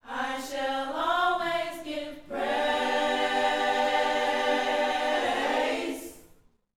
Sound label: YOUTH CH PHRASE, Vocal Planet Gospel